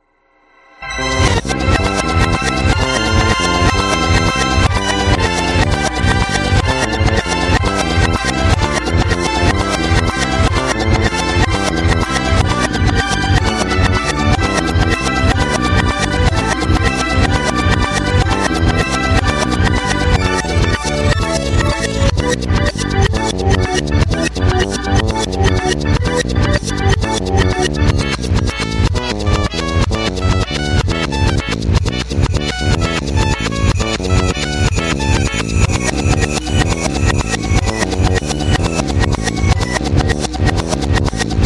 congrats music; reverse

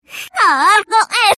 clock, reverse

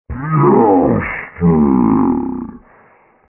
blast off

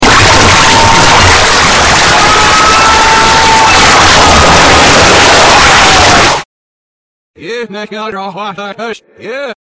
pee; reverse

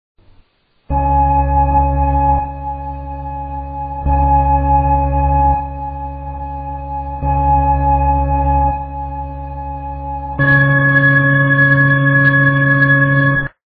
race start; vapesquared

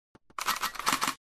reload; cave

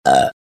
burp

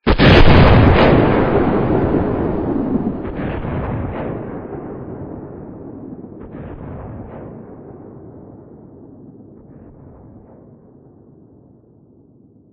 gun; vapesquared

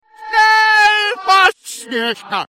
train can't stop; reverse

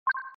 steam

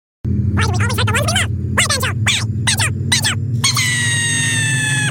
why banjo

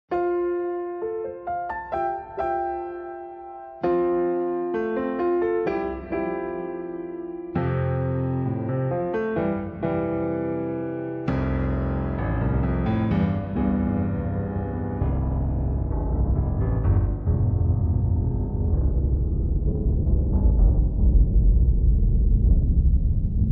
cheers

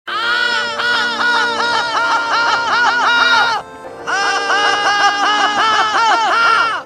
laugh; tidus

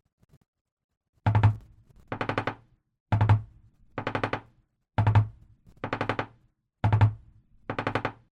knock

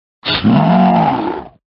the sound iron knuckle makes in ocarina of time

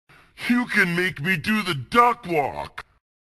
duck walk

